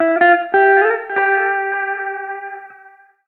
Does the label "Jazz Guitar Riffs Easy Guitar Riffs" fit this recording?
Yes